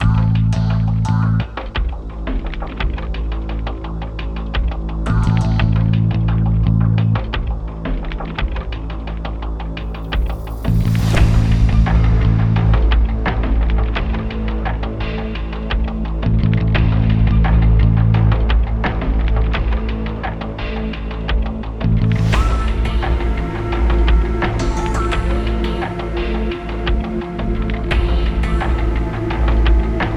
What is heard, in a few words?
fight calm